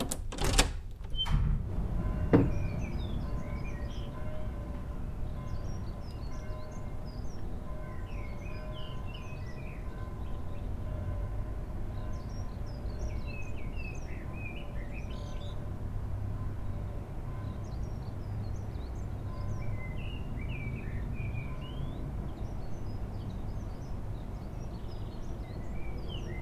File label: Opening Door Bells Birds Traffic Jam In The Distance